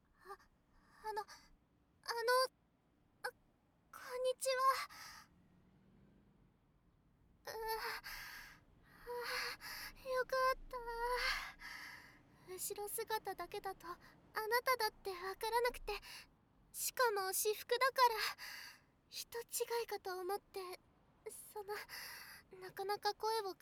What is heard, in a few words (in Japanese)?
電車の中で; 待ち合わせ、電車の中で